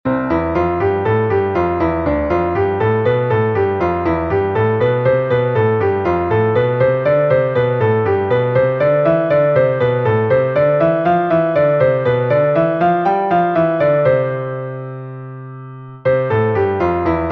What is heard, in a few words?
Hanon 1b-Like Hand Warm-Up - 2 Octaves, Piano